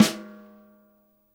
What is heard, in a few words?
KIT SD, Drums Acoustic; USB Soundscan vol.10; AKAI; 90s sample CD; ALL SD